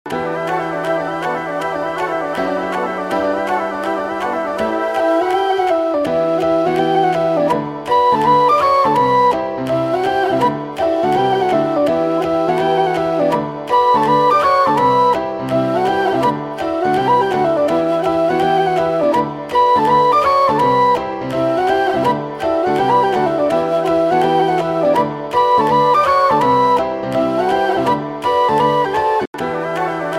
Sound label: Low Whistle Cover